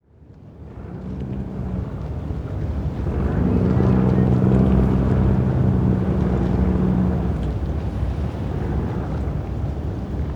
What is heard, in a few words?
hangar ships passby, ambient; War Thunder